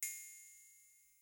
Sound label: Cym; Sonic-Potions LXR; Mars